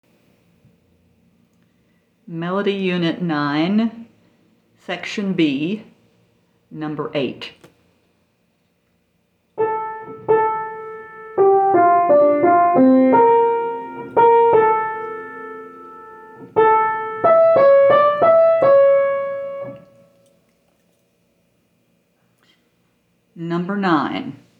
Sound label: melody, ear training recordings